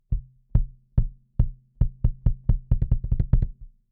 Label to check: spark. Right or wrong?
Wrong